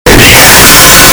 yaaaaaaaaa distorted

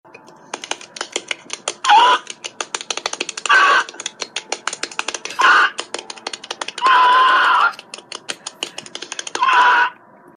weird noises

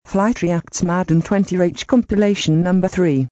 rage; flightreacts; madden 20

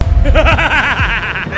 bwahaha; adudu

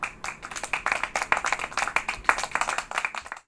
clap small

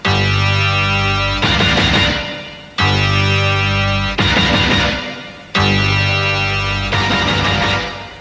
dun dun dun

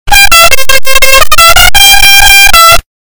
car horn; gofy gober

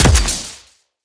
firing sound; deadlock

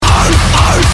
arfarf

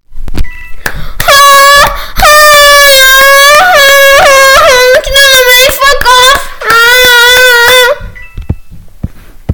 loud

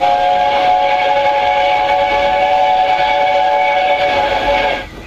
whistle; lner sir nigel gresley

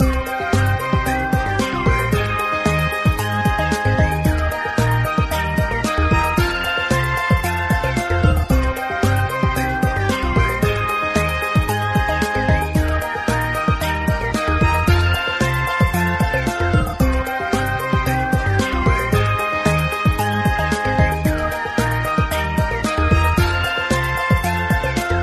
draw screen music; brawl stars

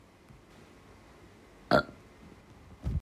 blurp

